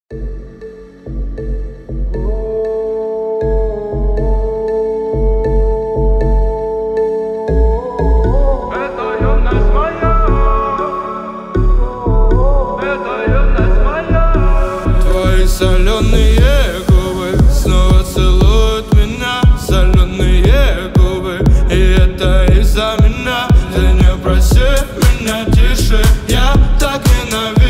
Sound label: bass